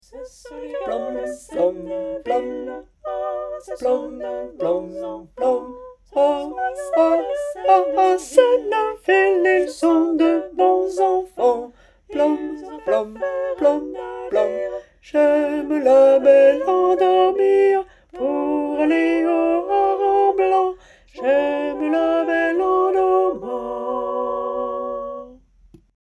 Les Gars De Senneville Basses; Version chantée

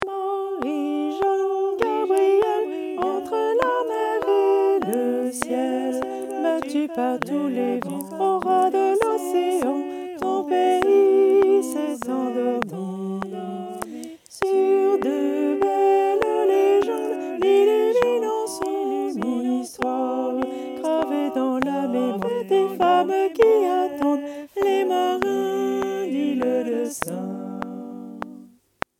Sopranes; Marie Jeanne Gabrielle

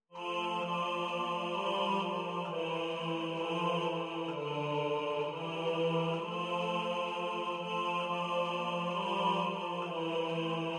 DIS MOI BEAU PRINTEMPS Voix; Ligne 3 (répétée)

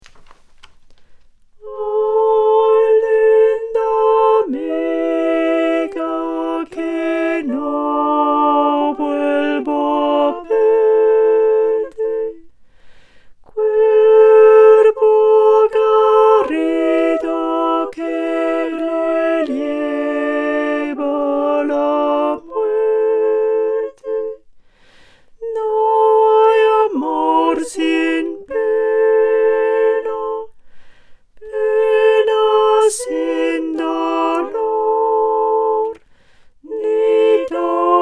Basses; Ay Linda Amiga

